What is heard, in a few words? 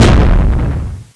Explosion; Playable Tutorial Level; TR1